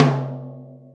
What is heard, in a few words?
gretsch tom, deep house single hits; Zero-G Deep House